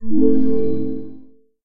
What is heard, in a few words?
Item Success; Tales of Sorrow Strawsbrough Town